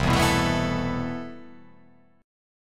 C Major 13th C

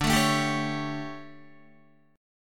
D Major 7th Suspended 4th, D, down, Guitar; strummed

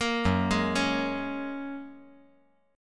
F sharp Major Add 9th, guitar

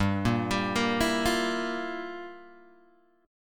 G Minor 13th G down Guitar Open G

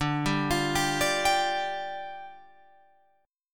D Minor Double Flat 5th, down, Guitar, Open F; Dmbb5; arpeggiated; JGuitar

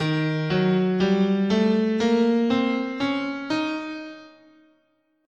D sharp Hungarian Major, scale

